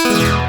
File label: Speech Off; fenrir